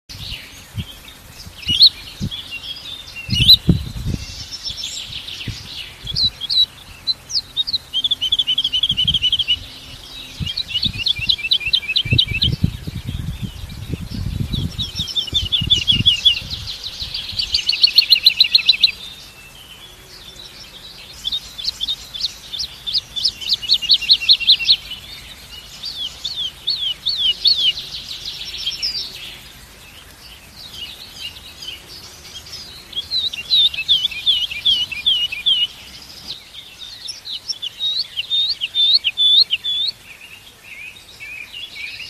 Bird Lark Sound

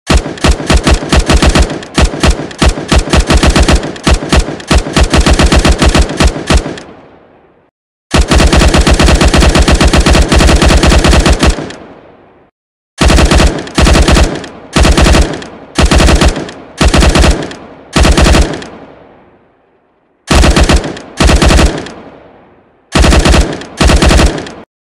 Steyr AUG; اسلحه اشتایر آاوگ

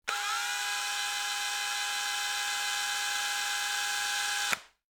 electric drill

